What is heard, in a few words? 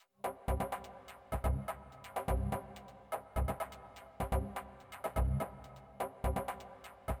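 Americana Electric Beat Panning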